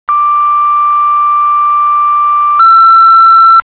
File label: Tones, Fire Company; ADAMSTOWN 1-1; Lancaster County Fire Company; Zone 1; Tones of Lancaster County Fire Company's